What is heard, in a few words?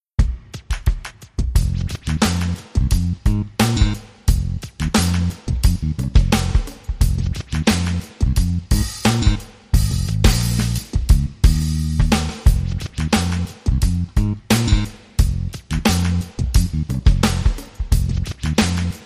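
Drum and Bass; DnB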